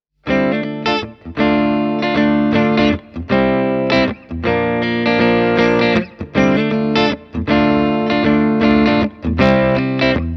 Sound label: blackstar ht metal 5 clean shure